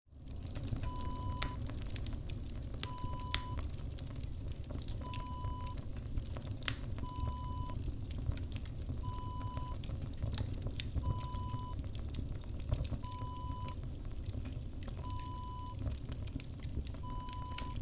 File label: wood burning kitchen stove